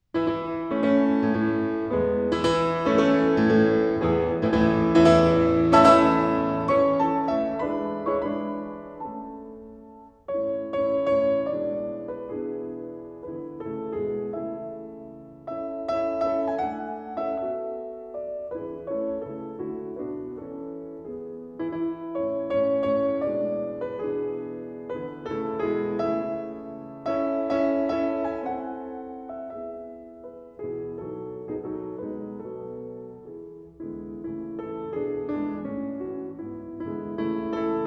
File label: Songs without Words for piano in A major; Mendelssohn